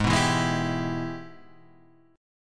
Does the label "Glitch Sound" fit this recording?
No